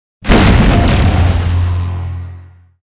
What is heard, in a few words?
blasters